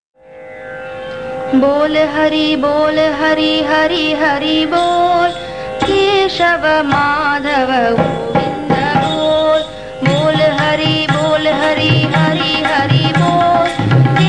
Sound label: Bol Hari Bol Hari, krishna bhajan; padavali